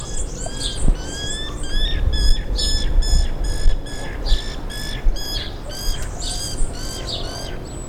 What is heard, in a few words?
Guira guira shrill